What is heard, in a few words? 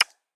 Motion Design Digital Text Click; SoundsCrate